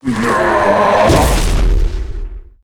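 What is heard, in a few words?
ghost attack, monster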